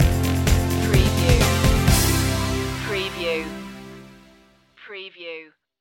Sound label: lexicon stinger